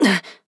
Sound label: Grunt Attack; Paladins; Flak